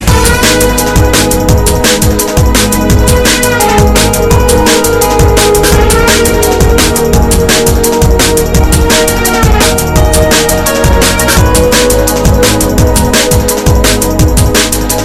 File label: DNB ringtone